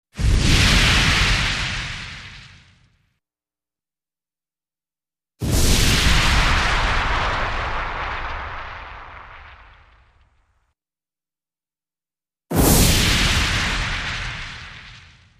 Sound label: Explosive Fire Burst, More Fire, Good For Catapults, Fireballs, 3x